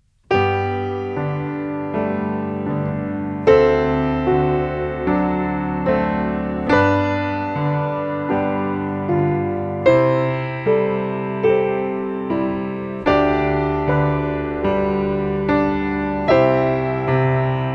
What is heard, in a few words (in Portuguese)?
Escala de SOL Maior, Violino; Violino para os Pequeninos